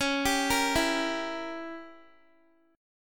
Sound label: Db Diminished 7th Db down Guitar; Dbdim7; arpeggiated; tuning=4E,4A,5D,5G,5C,6F